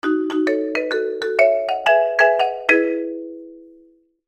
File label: marimba ringtone